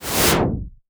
IMP WHOOSH

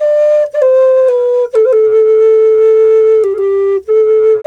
D2 108, Didgeridoo; Big Fish Audio - Didgeridoo and Other Primitive Instruments; FLU 108 BPM

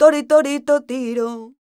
voc a#, Flamenco, Vocals, Female Cantaora; Female Cantaora 2

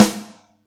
Yamaha EX-5

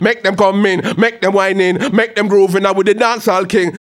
HAL RAGGAM, RAGGA-MUFF C maj; Sound & Vision - Gigapack II

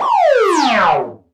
Misc Short FX

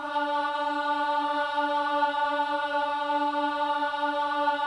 BOYS AHS, Symphony of Voices

